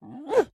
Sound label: Wind Up; Joblin; Going Under